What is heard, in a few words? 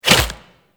Infested Tendril Step, movement